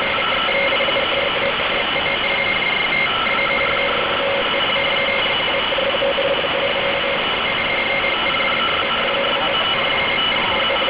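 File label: dct-800 50 baud; 8151khz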